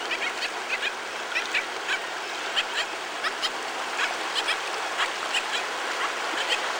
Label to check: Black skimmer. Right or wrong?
Right